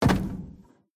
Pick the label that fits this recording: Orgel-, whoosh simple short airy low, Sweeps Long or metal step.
metal step